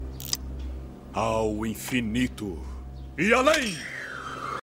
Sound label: buzz